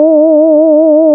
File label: LEAD, Nord; Wizoo - Powered Nord; LEAD PAD HRN